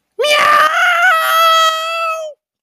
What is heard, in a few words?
miaaau